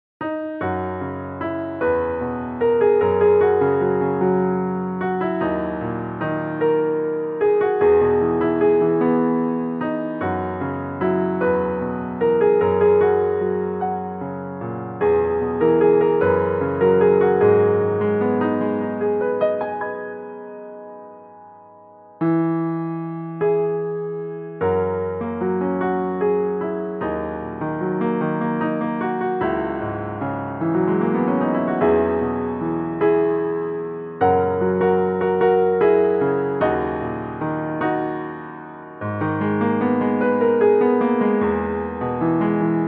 Piano

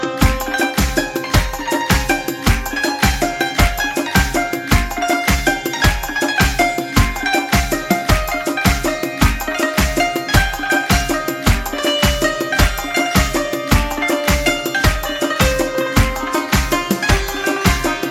Santur, play back

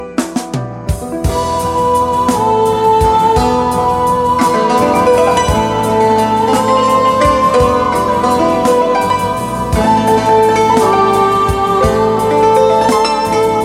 Santur Accordion

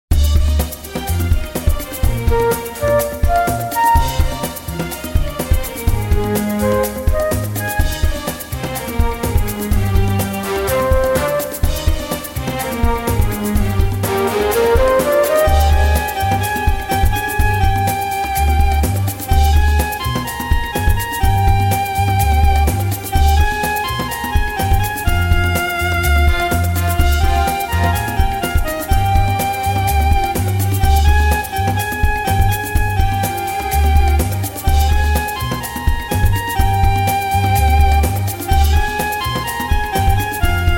Melody

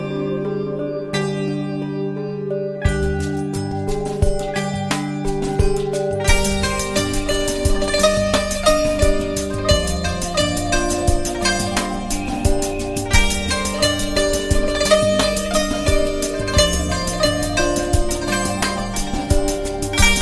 Santur

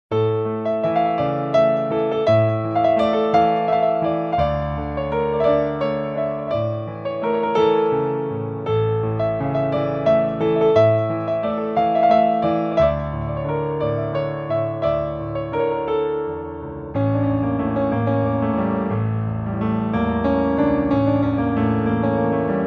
Piano

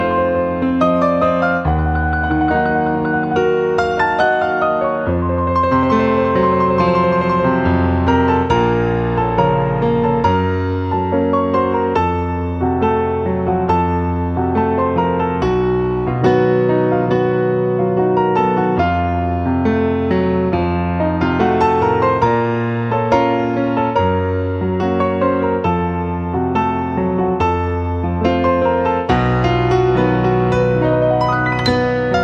Piano

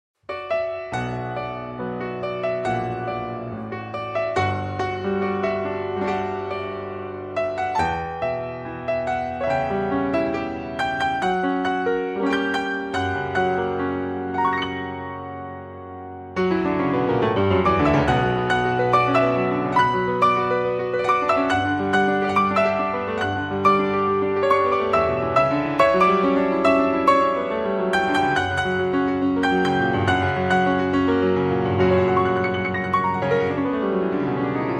Piano

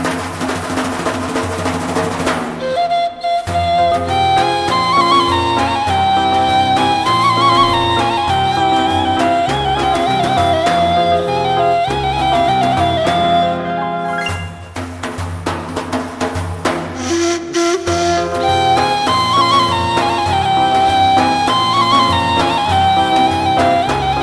Ney

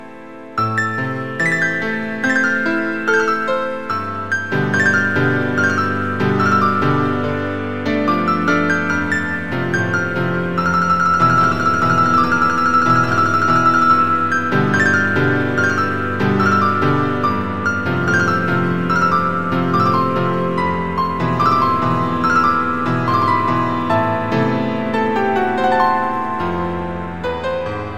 Piano

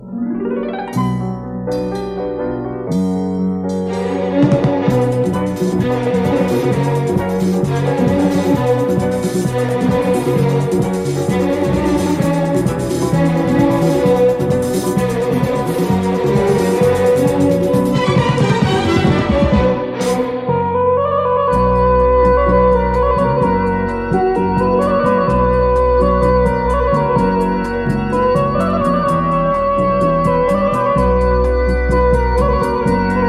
Melody

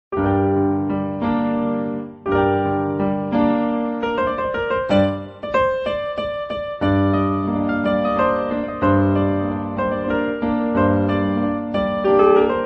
Piano

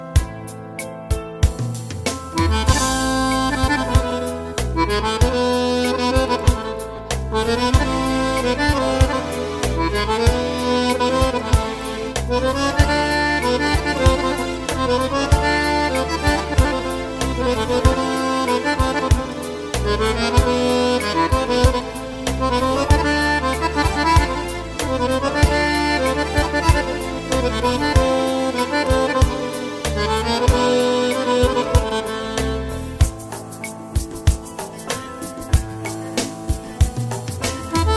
Accordion

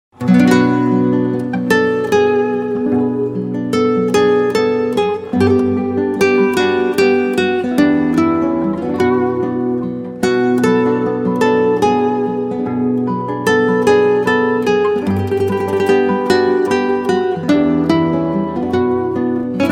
Guitar

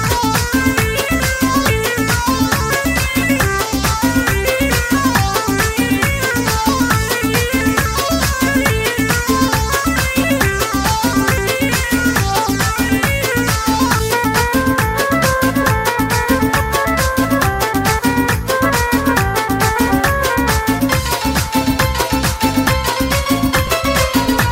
Melody

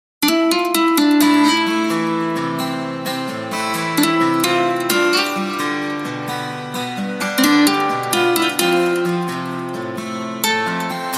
Guitar

